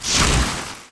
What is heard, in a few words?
chain; RYL 2 v1673